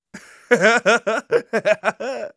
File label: laugh evil